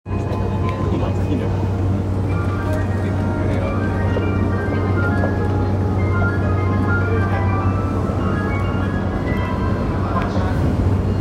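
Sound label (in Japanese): 山手線原宿 clockwise, train sounds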